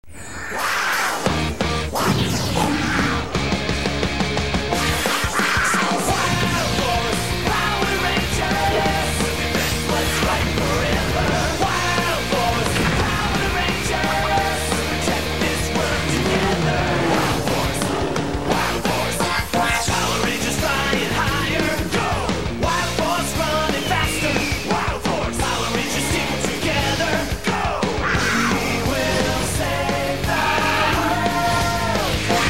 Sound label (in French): musique generique